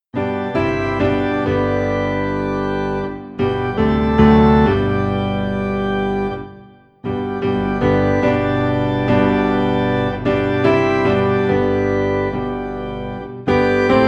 Piano and Organ Low in G; I Have Decided to Follow Jesus

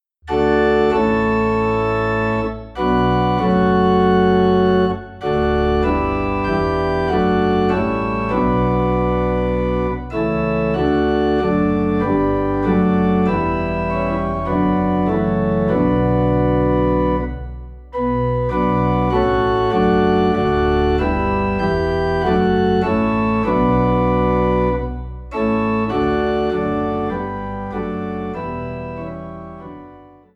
Organ Low in B Minor; christmas; O Come O Come Emmanuel

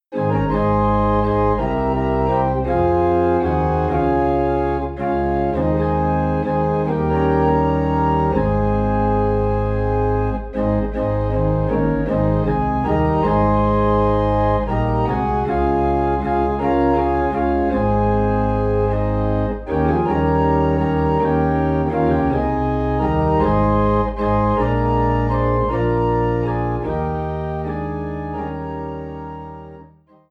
Organ in A Flat

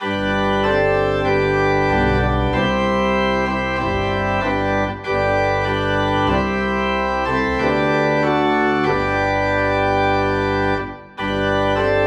Organ in G; Tis So Sweet to Trust in Jesus

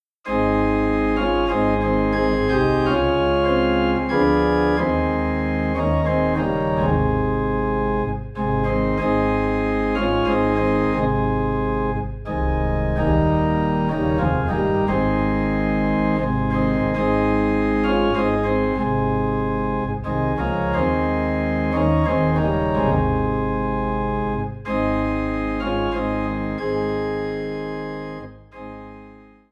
Organ Low in A Flat; Jesus Paid It All

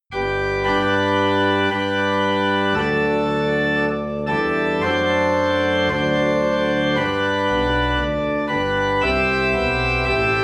Organ in G

